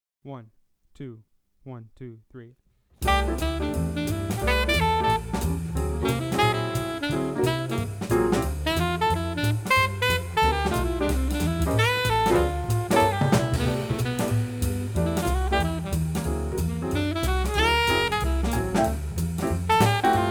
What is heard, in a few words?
alto